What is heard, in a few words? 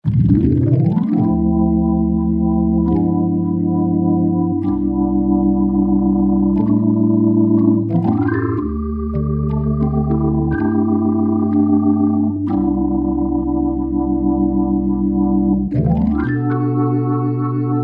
Organs, Yamaha A4000; Piano - Keyboards